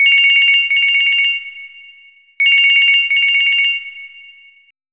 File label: ring ring, ringtone; openmoko